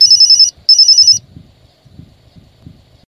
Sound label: click click classic